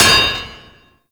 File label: Worker Hammer Impact Metal; Warframe; Grineer